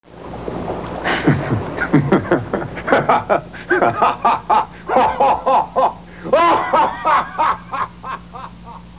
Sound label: cackle